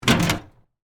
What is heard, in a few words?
Cabinet Door Open, Metal, Small; BlastWave